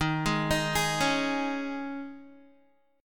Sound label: Eb 7th Suspended 4th, Guitar; Eb7sus4; arpeggiated